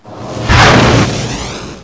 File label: FLYBY; Duke Nukem 3D Kill-A-Ton Collection